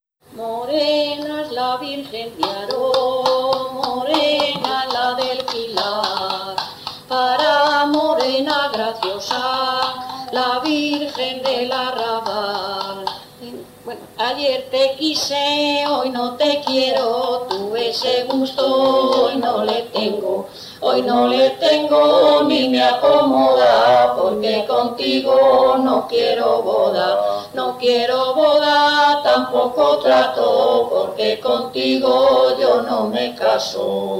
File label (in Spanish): Jotas; Jotas de Simancas